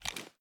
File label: reload empty fast start; gmod